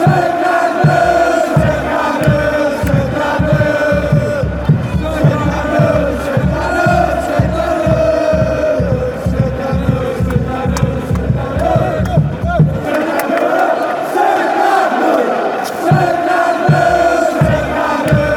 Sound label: denmark chant